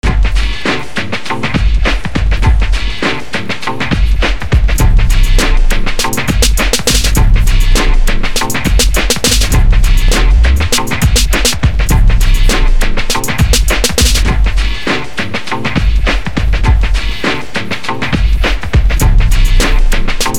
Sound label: Instrumental